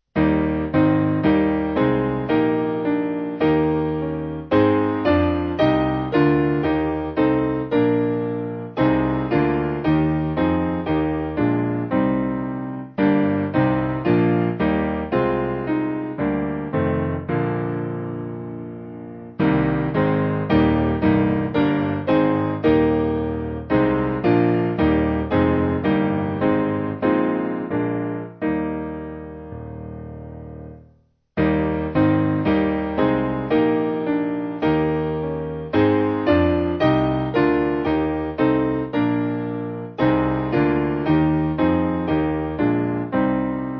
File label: Piano; Gottes Sohn